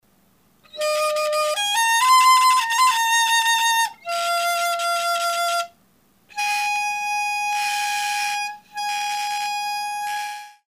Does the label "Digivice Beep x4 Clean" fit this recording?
No